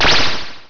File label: Laser